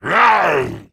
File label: Creatures Fight Attack; Mighty Party; Android